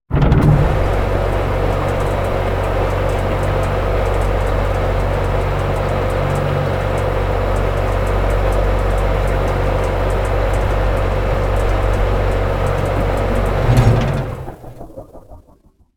landing 4, ships movement

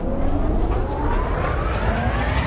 fuses, trolley

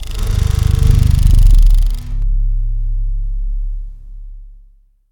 rod retract; nebula

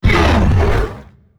attack, creatures; combat; ryuchi; CosmicRage

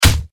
punch, socials; CosmicRage

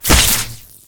flesh; combat; weapons; default shootable

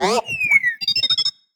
happy, baby

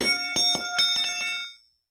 hammer; CosmicRage; activities farming

